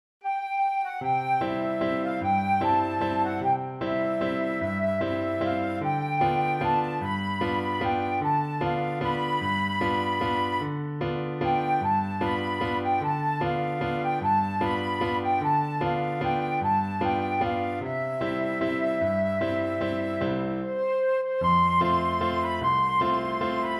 flute

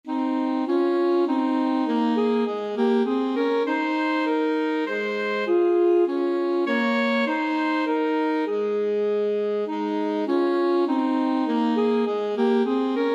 alto saxophone duet

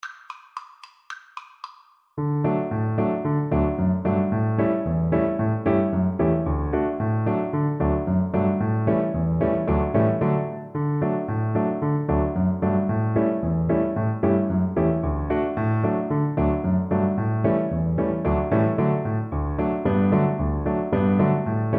Mandolin

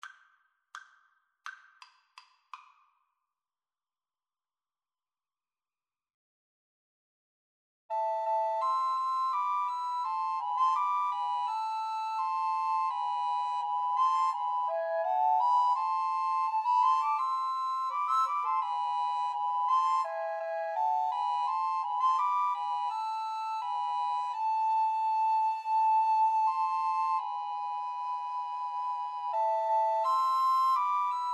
patapan, Recorder Trio

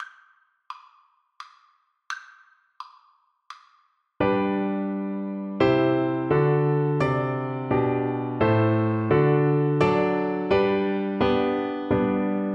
sonata, Cello; marcello

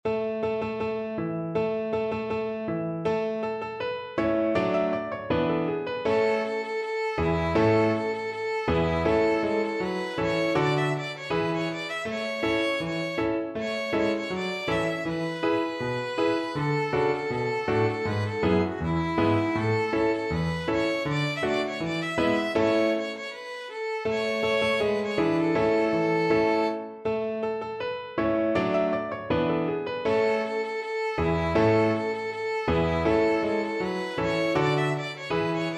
violin

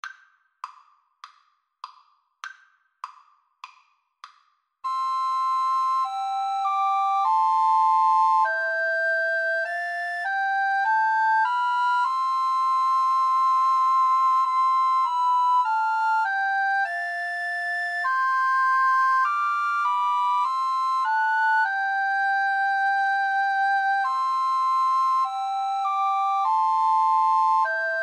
abide with me, Recorder Trio

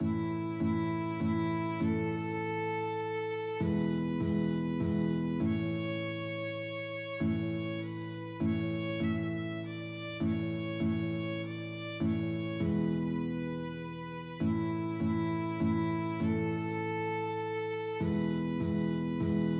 come holy ghost violin guitar duet

